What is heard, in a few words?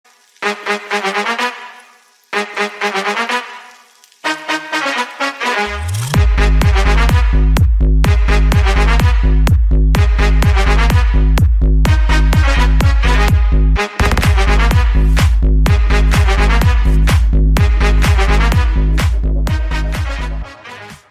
Ringtone